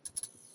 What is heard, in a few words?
Light Flicker; The Joy of Creation Halloween